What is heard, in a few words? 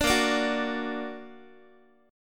Bb Augmented Bb, Guitar; strummed